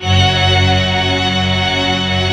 SYM ORCH F#3, Korg; Back In Time Records; Korg Universe VOL-1; Korg SYMPHONY2

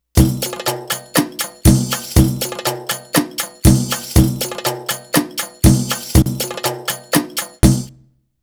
Arabic Rhythms

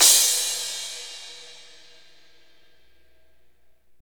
cymbal crash, Roland; Northstar; Drumscapes Roland; Cymbals 1

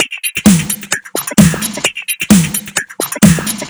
Groove, 130 BPM, Percussion; Sonic Foundry (Sony Creative Software); Underground UK House & Electro

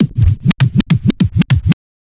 Scratch, Trance

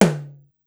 Tom Mid, Korg Wavestation-SR Drum Kit; Wave 455 - Drum Kit

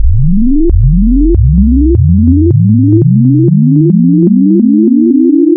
Add Sine 2N, wavetables Additive; Architecture 32

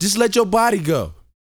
VOCAL; Spectrasonics Vocal Planet

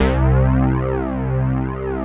POLY SYNT C2, Moog; USB Soundscan vol.49 - Analog Fantasies; AKAI

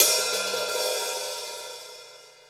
open, Drums Percussion; Drum Bass; Zero-G; Total Drum Bass

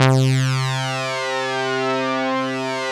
DS8 C 2, Korg; Back In Time Records; Korg Universe VOL-1

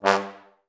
TB STAC G1, Advanced Orchestra; Best Service; Peter Siedlaczek's Advanced Orchestra

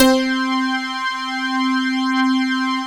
DIGI ZITHER, SYNTH

